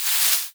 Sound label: brush on movements